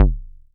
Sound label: kicks, drum machines, FutureRetro FR777